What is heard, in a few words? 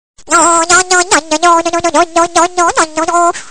funny message